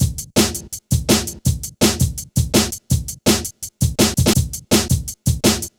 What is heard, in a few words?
Hard Stepz, Jungle, Drum Loops; Zero-G Jungle Warfare 1, 2 & 3; 90 s Sample CDs